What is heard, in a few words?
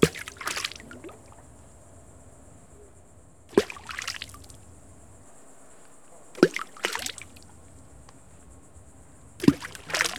water splash pebbles; best service Blue Box; water fx